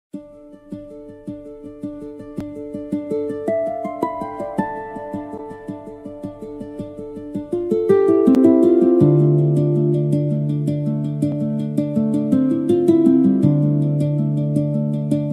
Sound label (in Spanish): dulcimer tono